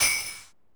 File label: SE GAME GOOD; maimai